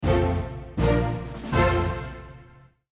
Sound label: Level complete; Dungeon Crawlers Metal